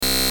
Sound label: GLITCH BUZZ, Glitch, Error, Beep; Multimedia; Production Element, Imaging Element, Accent, Transition; Glitches; Sound Design; Blastwave